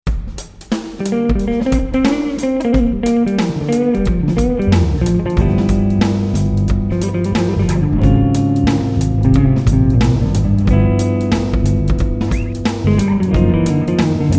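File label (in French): Style jazz & fusion; guitare; Amazing grace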